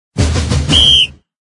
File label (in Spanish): sonido tambores y silbato; Tonos de videojuegos; rumble stars